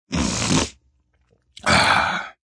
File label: Snort Swallow, Male snort, swallow, sigh; Snorting; Human; (human voice)